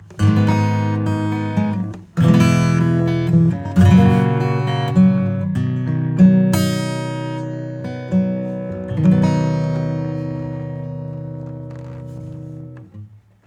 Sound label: Guitar Hot; Nanopulse